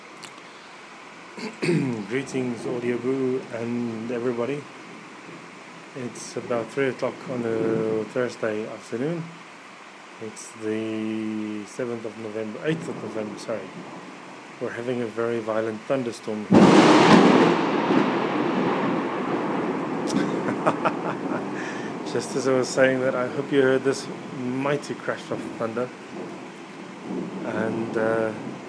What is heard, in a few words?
thunder thunder thunder